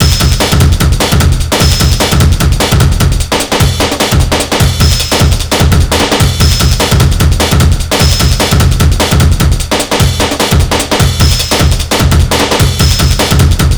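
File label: drum n bass; INIS; Funky Xtreams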